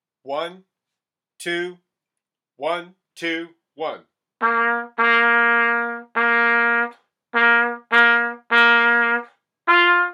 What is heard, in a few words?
Military March C part; virtualconcert